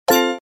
sound car turn

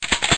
SWITCHING RUN; xyaoqianshu

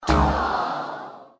GAME LOST

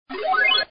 sound light up; forestparty

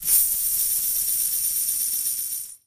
Coin Animation; xyaoqianshu

